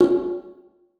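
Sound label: conga open, percussion; Sonatina Symphonic Orchestra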